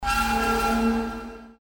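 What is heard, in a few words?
metallic screech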